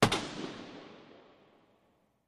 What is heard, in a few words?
Hockey Puck Hits